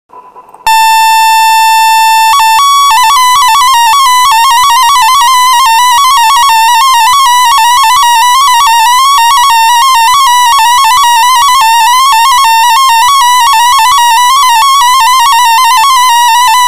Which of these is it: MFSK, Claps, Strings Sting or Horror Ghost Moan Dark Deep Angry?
MFSK